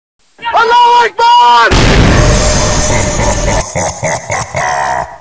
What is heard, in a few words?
nade